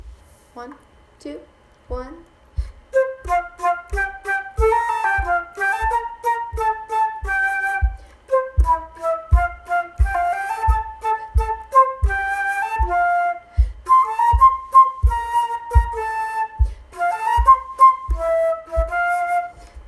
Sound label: oboe